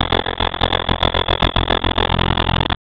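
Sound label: a sudden descending digital, warping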